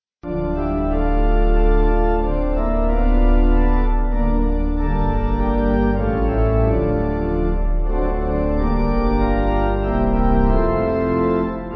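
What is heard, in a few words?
Pipe Organ, Music Backing; Kas Dziedaja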